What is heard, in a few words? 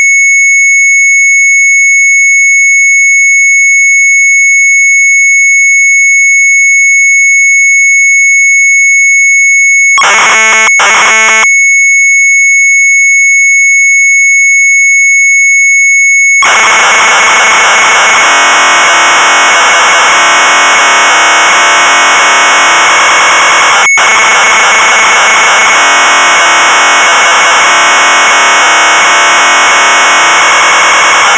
MZ800 Tape, sharp; retromelsarchive